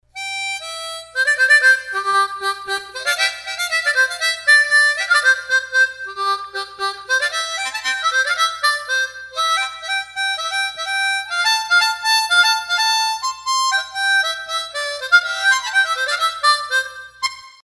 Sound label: harmonica turkey